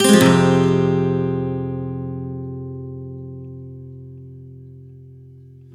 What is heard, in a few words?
F min9 upstroke, Acoustic Guitar; Sonic Foundry (Sony Creative Software); Songwriter Acoustic Guitar Companion; One Shot Chords